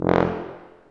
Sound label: TUBA C, stacc; Roland L-CDX-03 Disk 2